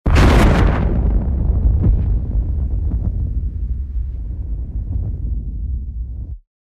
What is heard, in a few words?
Explosion